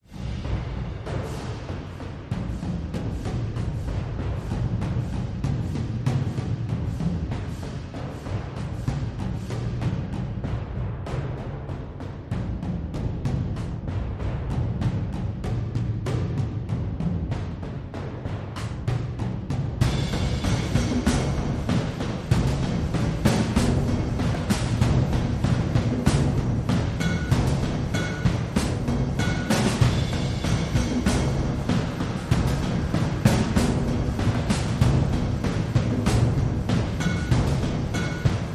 Thriller War Drums

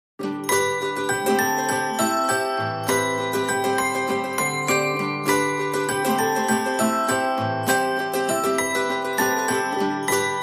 No Drums No Bass

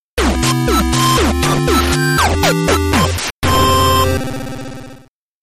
Childrens Houses Jingle

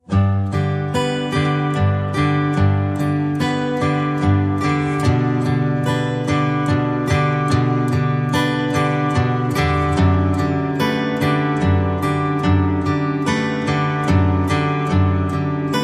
Christmas At Home, Guitars

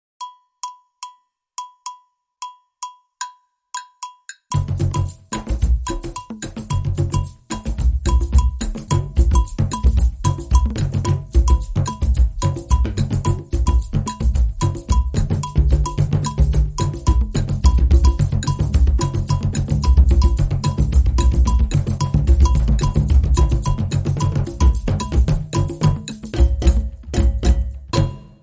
Festival Drums

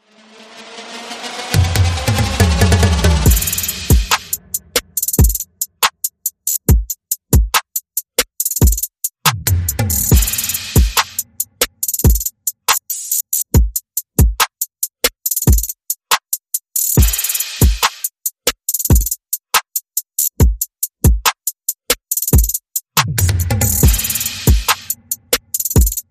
Perc and FX

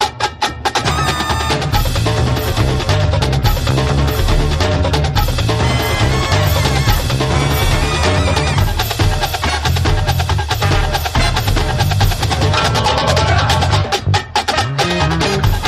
Plum Drums

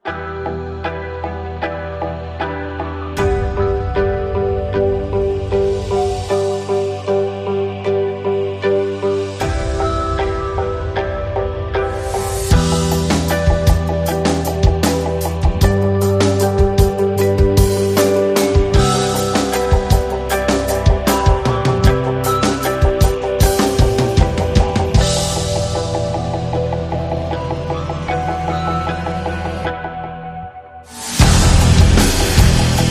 No Lead Melody

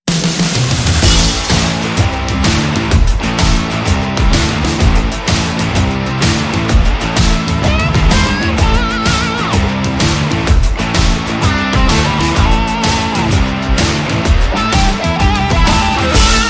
No Hammond Organ No Brass

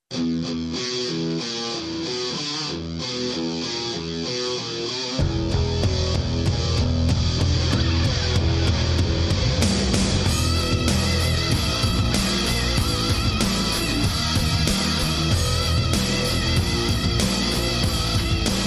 No Guitar Melody

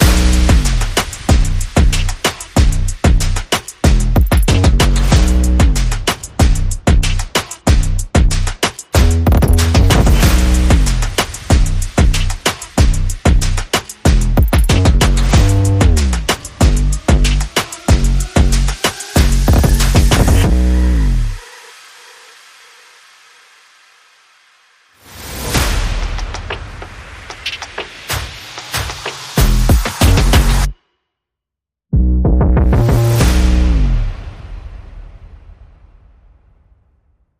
percussion bass and effects

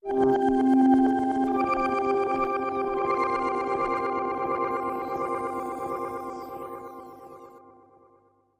Solfeggio Sting

